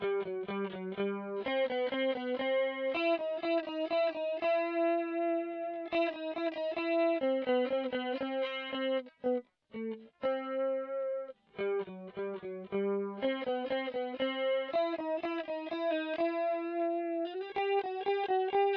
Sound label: Chorus